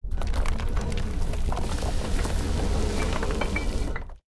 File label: spinn Reel No Button; parthenon